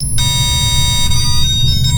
sonar Ping Water Close Beacon; Barotrauma; Real Sonar

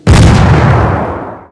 gunnery big flak explode; Weapons; EK modern; Barotrauma

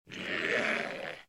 clown grunt; Barotraumatic; Deepseaclownnew

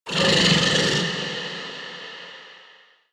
eel; Barotraumatic; Thermaleel

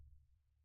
sonar Tail Air Medium Beacon; Barotrauma; Real Sonar

